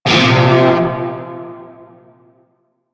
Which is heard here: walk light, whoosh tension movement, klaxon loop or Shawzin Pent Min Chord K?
Shawzin Pent Min Chord K